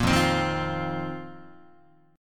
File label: F 7th Suspended 4th F down Guitar; strummed